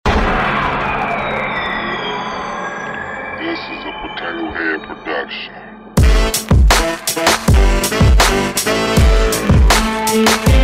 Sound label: Beat